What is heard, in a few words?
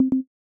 Media Paused, iOS Sounds